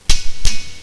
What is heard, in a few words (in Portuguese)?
catraca; de ufscar